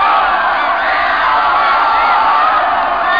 Crowd Screaming

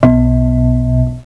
Bong; Cloudz 1.0.3

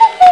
CUCKOO; PUZMANIA

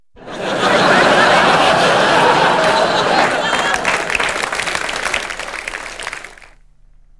APPLAU; L'Effet Pommier - Volume 04

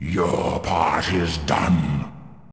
empty recycle bin; Desktop Themes 2000; Thief

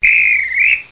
coach whistle; Franklin's Reading World

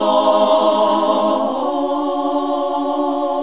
NUN CHOIR

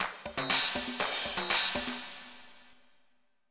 NEW AGE

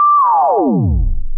sweep down; SpecOps2MPlayerDemo; Music

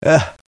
Sneeze; Sportware Golf

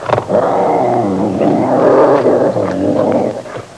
brown bear growling; forest animals; Sound Effects for Multimedia - Volume 1

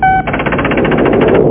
machine; McPoet 3.1; MacEasy Magazine CD - Issue 22

